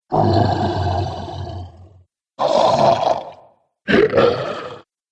Trolls Selection, Monster; horror

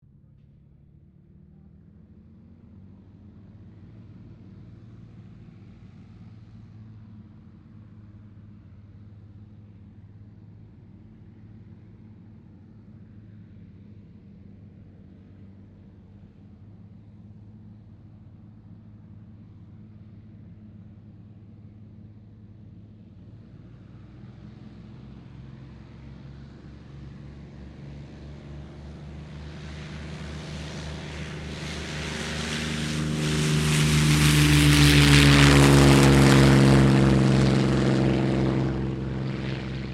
AIRCRAFT P-40F KITTYHAWK TAKEOFF; 1942; P-40F Kittyhawk / Warhawk/Tomahawk; Airplanes; Vehicles

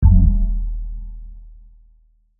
Hit Dark Muted, Bell; BlastWave

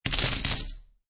Poker Chips Drop Large Stack Of Chips Onto Table; Gambling

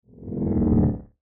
Sci Fi Machine Pass By; Spacecraft; Blastwave

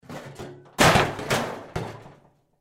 Metal Junk Fender Scrap Object Pick Up Drop; Scrapes; Blastwave; Foley

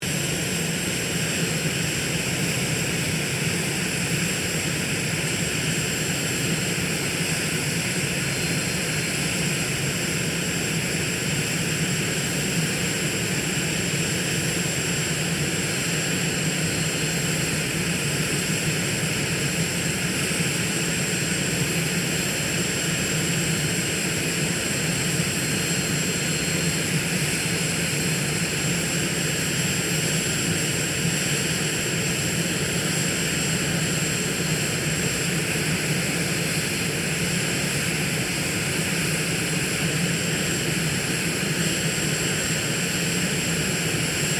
AIRCRAFT CESSNA A-37B DRAGONFLY IDLE; Dragonfly Jet Fighter /Bomber; Airplanes; Vehicles

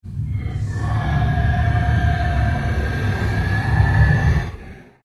Ambient Pulse Ghost Chatter

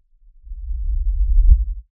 LFE Reverse, Lfe Reverse Element Swell Low Bass; Swells; Blastwave

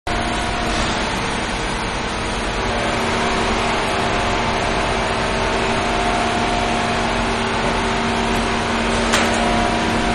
Construction Site Ambience; Backgrounds; Manhattan Production Music

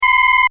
Computer Beep, Short; Multimedia; Stuart Duffield

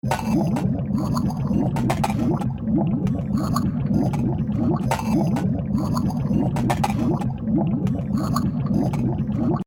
Water Machine Loop, machinery

